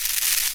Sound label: electro